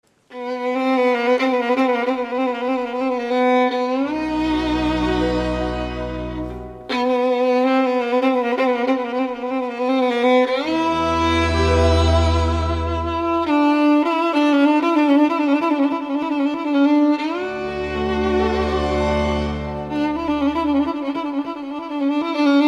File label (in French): le sifflet